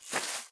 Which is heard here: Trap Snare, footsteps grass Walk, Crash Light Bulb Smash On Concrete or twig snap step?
footsteps grass Walk